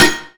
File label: hit monster; Birthday Party Bash; Wii; Spanish; laser gremlin